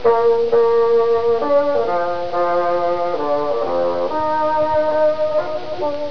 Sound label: bassoon